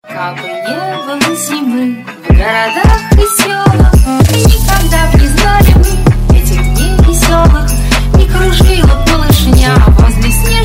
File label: trap remix